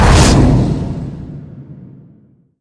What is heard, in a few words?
swing